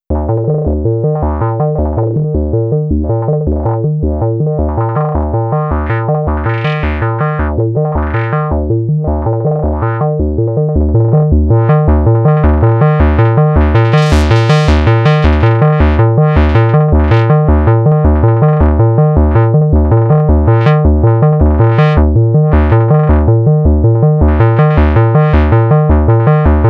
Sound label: Behringer System 100 Modulated Filter